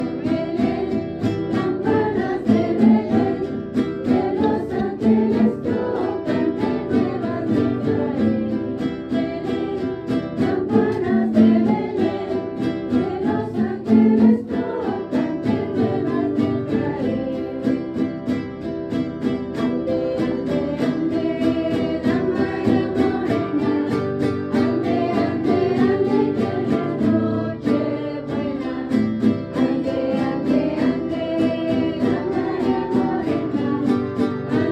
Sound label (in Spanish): campanas de belén